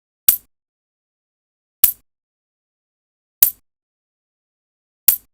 coin toss

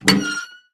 safe opening

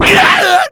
damage; hazama

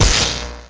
taser

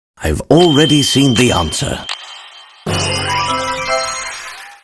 puzzle; pl-layton

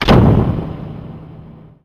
door close

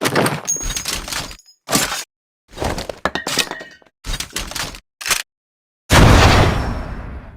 gun lock fire; gina

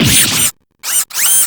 damage

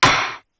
gavel

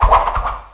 spinny

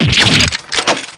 damage; dgs2-drebber

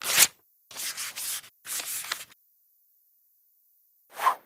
paper plane; Sorin

